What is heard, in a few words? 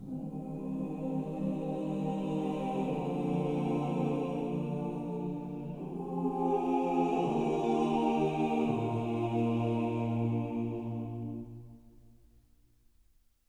Choir Bass Short; The Dwarves 2016 ps4 gamerip